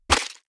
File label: hit